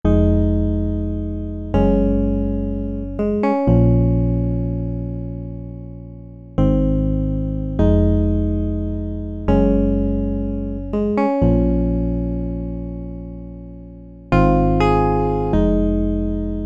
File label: Rhodes DRY